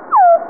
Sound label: bat